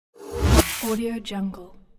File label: swoosh transition; anderfast